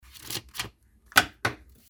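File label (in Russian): dollars; Звук купюр, долларов, отдельно и в пачках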